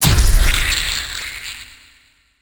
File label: ominous stab harsh; DELTARUNE